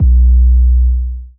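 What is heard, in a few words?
B Bass Deep; Deeeeep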